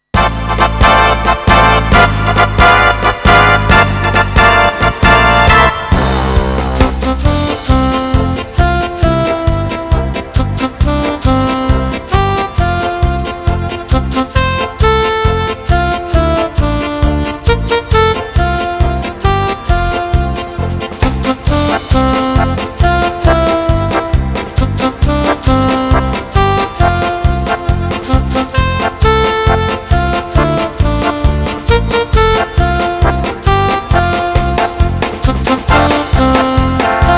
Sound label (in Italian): Tanti auguri Melodia